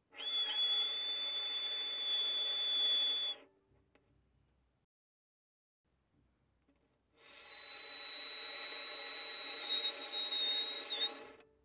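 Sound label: Violin arco fortissimo on E string Ab7 B7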